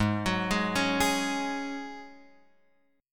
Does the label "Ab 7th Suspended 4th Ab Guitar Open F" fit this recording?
Yes